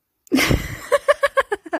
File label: Female Laughing Sound Effect